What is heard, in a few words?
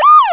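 siren fast; ROADRASH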